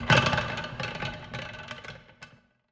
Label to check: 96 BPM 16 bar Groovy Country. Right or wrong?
Wrong